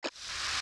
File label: Steps Push Crawl Shallow Water; Zelda; OOT